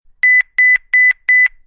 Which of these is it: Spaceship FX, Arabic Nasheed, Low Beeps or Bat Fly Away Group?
Low Beeps